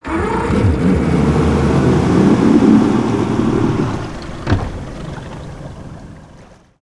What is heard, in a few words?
boat, vehicles insertion; Counter Strike Model Pack; CS:GO